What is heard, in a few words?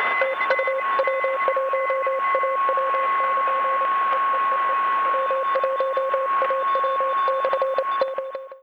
cw 7 mhz